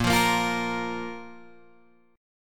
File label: Bb 5th, Guitar, Open G; 8,10,8,10,11,8